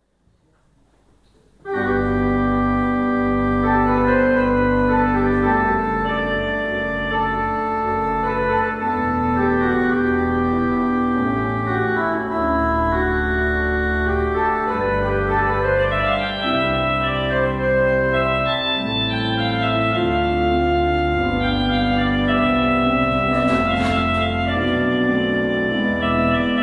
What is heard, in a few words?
Cornett